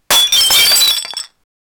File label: glass bottle smashing